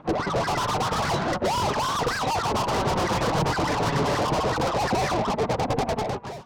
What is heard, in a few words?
scratching DJ effect